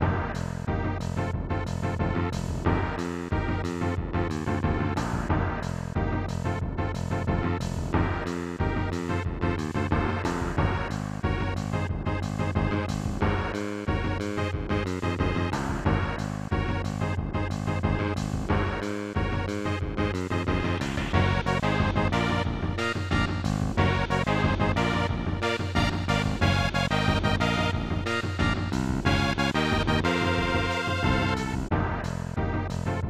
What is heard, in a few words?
Festival Drums; Ogre Battle 1993 snes